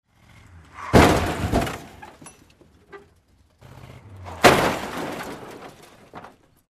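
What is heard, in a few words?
car crash, collision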